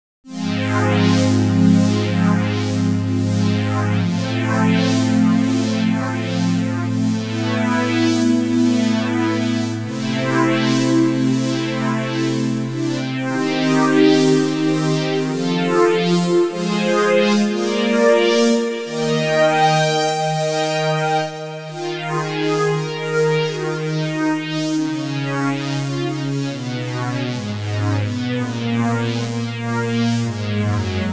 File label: pad